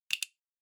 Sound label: Stopwatch Mechanical Stop Hand